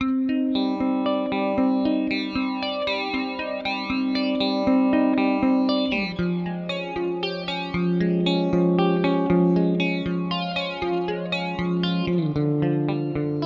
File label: Yamaha THR30 II Classic Clean Flanger Hall